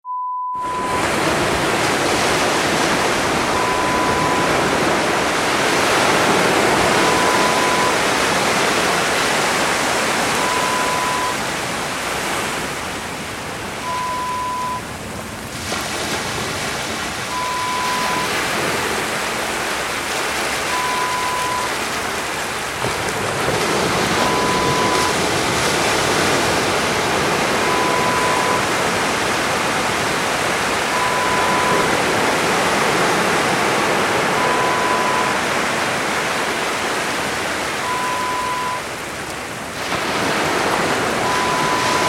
Sea sound

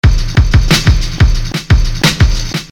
BREAKBEAT

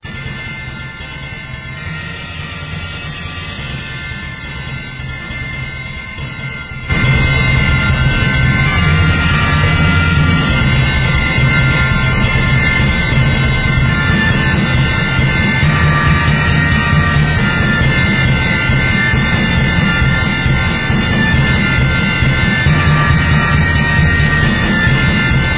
Manic Percussion

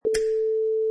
Finger Snap

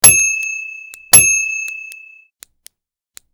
Service Station Bell Driving Over

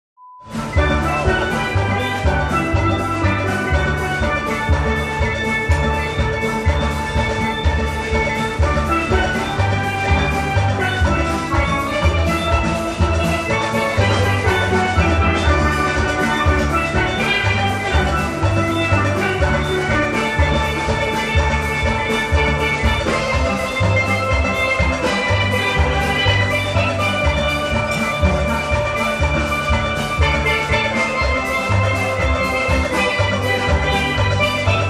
Steel Drum Band

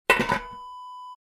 glass jar lid close

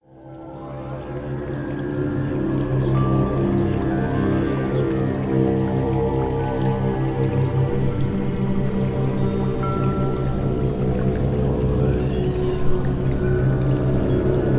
Deep Meditation

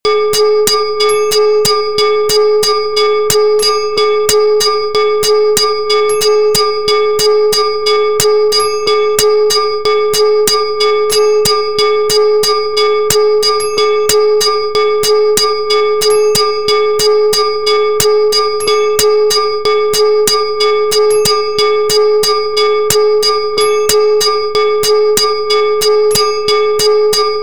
Train Crossing Bell

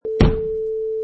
Thud

